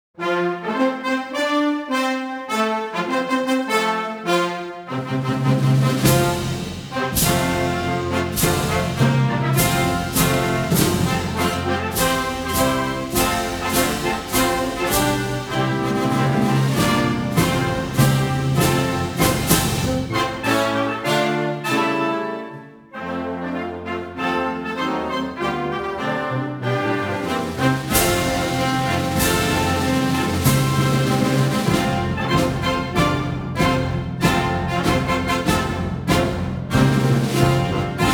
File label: China - National Anthem, Orchestra; The National Anthems